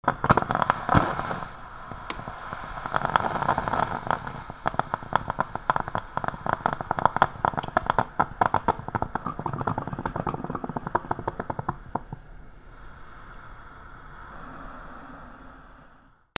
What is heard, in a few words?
KITCHEN